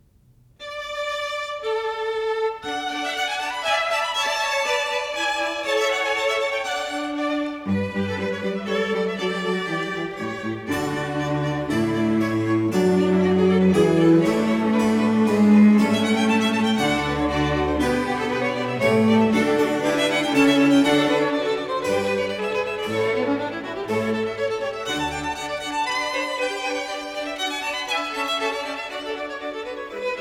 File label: Piano Concerto No. 2 in A Major Allegro deciso; Liszt